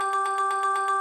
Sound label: count Up Loop; excalibur